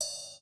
raw crash d sharp key; Cymbal; drum sample; one shot

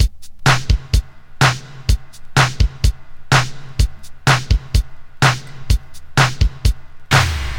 126 bpm breakbeat sample c sharp key

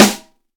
noisy snare drum sample g sharp key

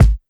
raw steel kick drum sample g sharp key

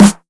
sharp snare drum sample g sharp key

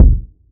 low tone bass drum e key; kick drum samples

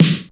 clean snare single hit e key

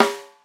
snare drum G sharp key; snare drum samples

